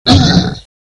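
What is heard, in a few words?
zombie hurt stamper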